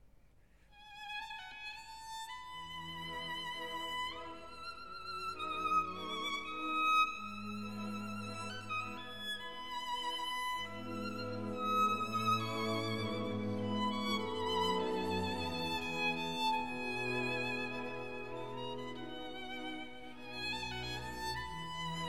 Violin Concerto No 5 in A Minor Andante un poco sostenuto